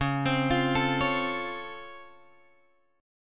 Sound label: C sharp Augmented Major 7th, guitar chord